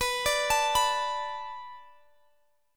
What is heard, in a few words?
B Minor 7th B Guitar Drop D, chord